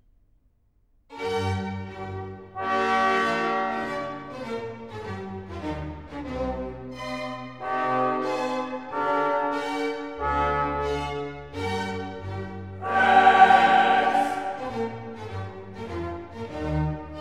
Requiem for soloists, chorus, and orchestra, Rex tremend; K. 626; 3. Sequentia: 3. Rex tremendae; Mozart